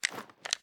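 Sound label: pistol reload empty mag large raise; xmag; gmod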